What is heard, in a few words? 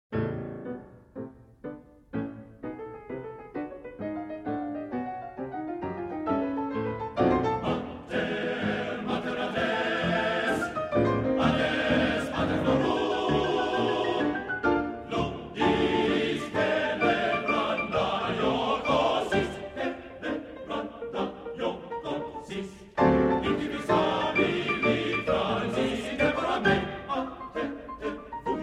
tarantella